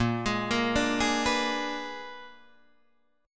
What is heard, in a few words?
A sharp 7th Flat 9th, Guitar Open D chord